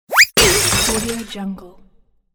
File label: cartoon slip crash